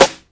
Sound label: perfect steel snare drum sample c sharp key